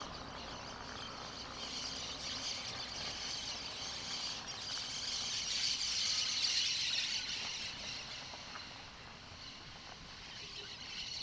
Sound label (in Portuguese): Revoada de periquitos; aves; canais surround 5 1